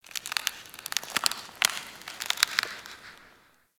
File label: Ice Creak Echo; Ambience; Warframe